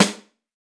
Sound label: small reverb acoustic snare sound G sharp key, snare sound tuned to the G# note; snare drum samples